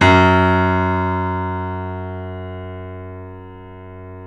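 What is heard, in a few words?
piano, F#, right; InVision Interactive - The Piano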